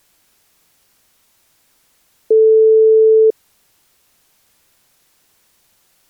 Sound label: audio input noise levels; canon xh series